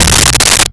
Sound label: Bass Slid; BGM; Nintendo; July 2020 Gigaleak; DrMarioSound